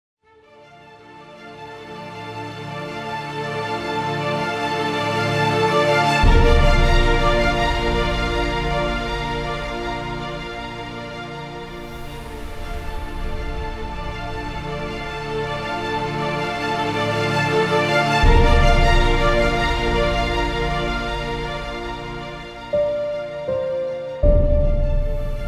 Epic Uplifting Movie Trailer

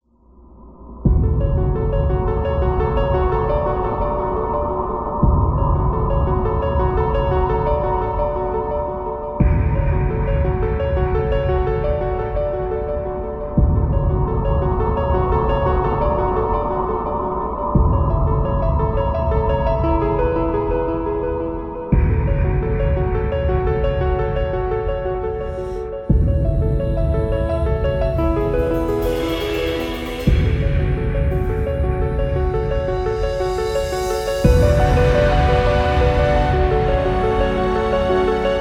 Cinematic Trailer Emotional Intro

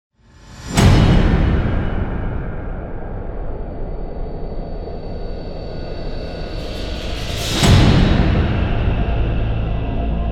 Intense Trailer

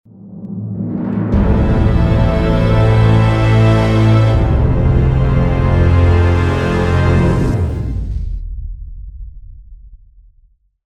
Epic Cinema Logo Ident

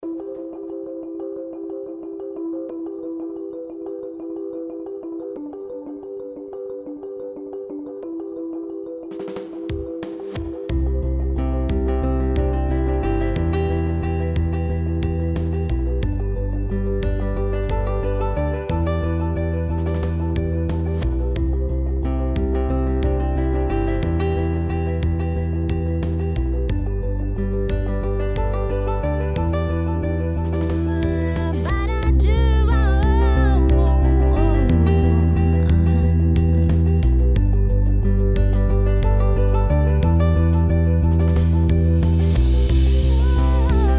Corporate Motivational Inspiration

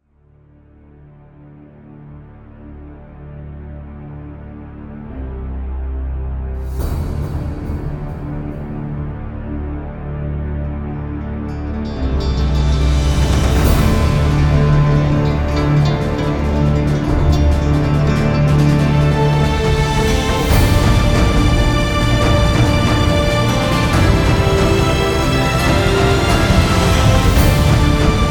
Epic Logo Intro

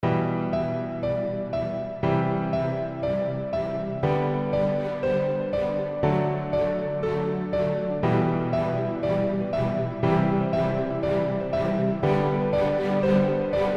Epic Rise

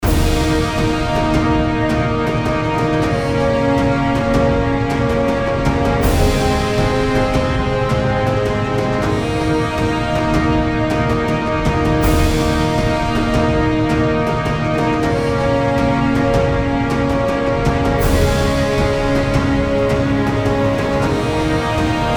Dramatic and Action Champions Anthem

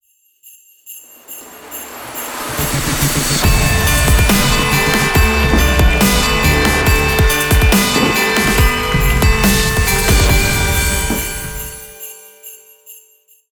Christmas Intro Dubstep

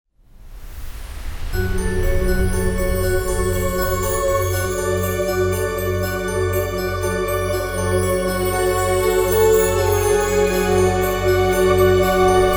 Christmas Magic Opener

